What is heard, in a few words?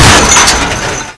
m134 shoot